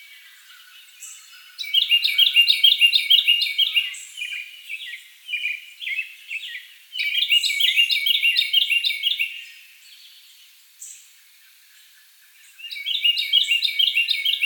Carolina Wren, Thryothorus ludovicianus

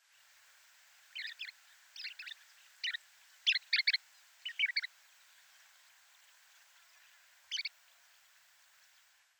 Chestnut-collared Longspur Calcarius ornatus; sparrows

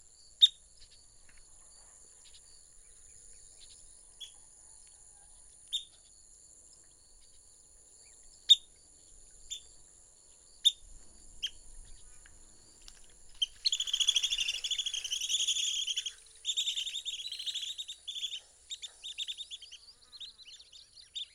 Long-billed Dowitcher, Limnodromus scolopaceus; shorebirds rails

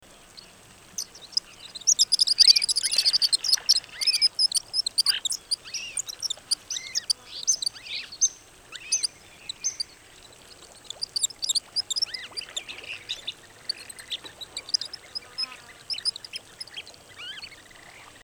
White-rumped Sandpiper, Calidris fuscicollis; shorebirds rails

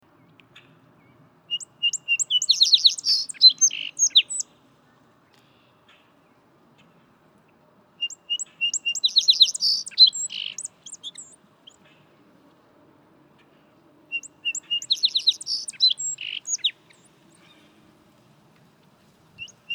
Song Sparrow Melospiza melodia; sparrows

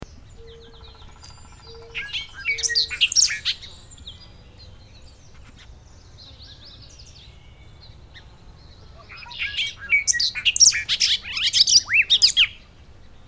Bobolink, Dolichonyx oryzivorus; blackbirds orioles

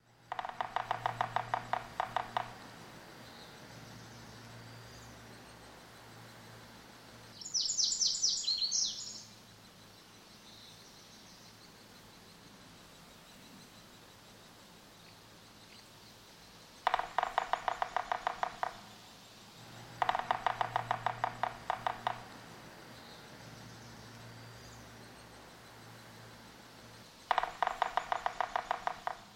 Red-naped Sapsucker, Sphyrapicus nuchalis; woodpeckers

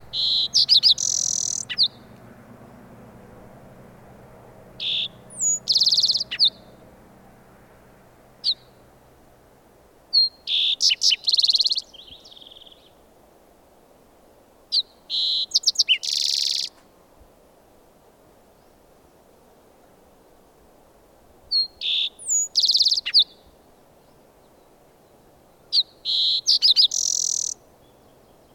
Green-tailed Towhee Pipilo chlorurus; sparrows

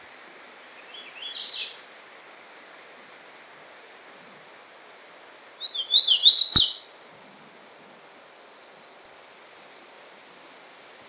Hooded Warbler, Setophaga citrina

